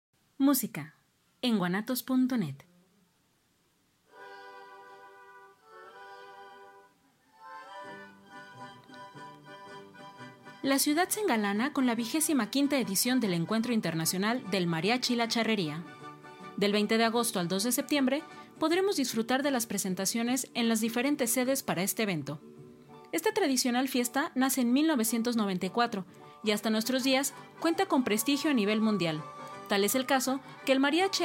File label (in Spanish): mariachi; galas del mariachi